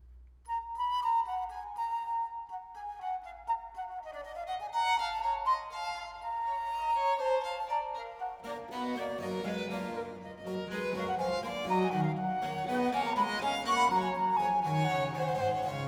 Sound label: Trio Sonata No. 3 in E Minor, HWV 398 II. Allegro